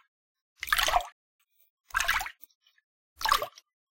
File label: gentle swim